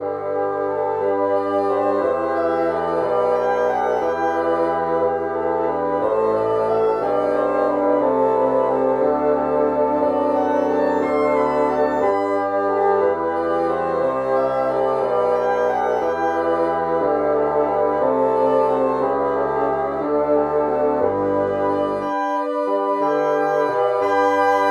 bassoon

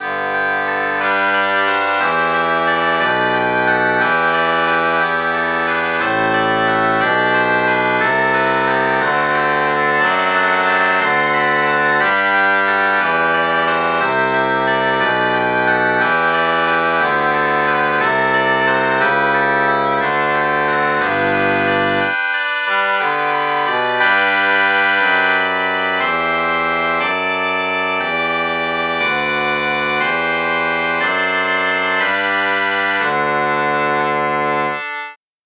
shenai

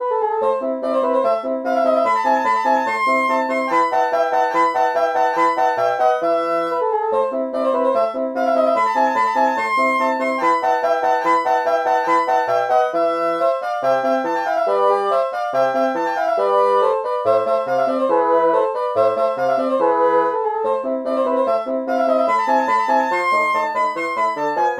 bassoon

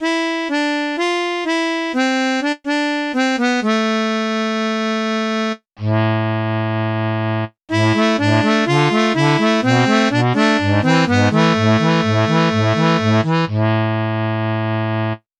harmonica

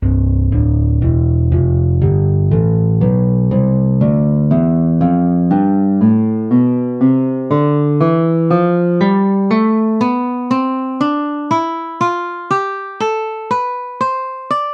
nylon string guitar tonleiter

